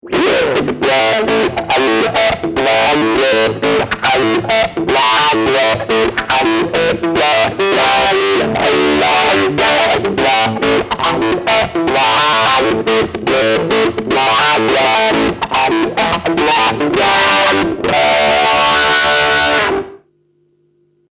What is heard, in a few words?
Groove